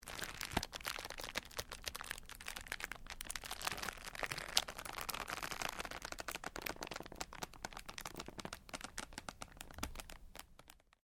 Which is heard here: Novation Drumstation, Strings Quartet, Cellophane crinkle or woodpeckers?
Cellophane crinkle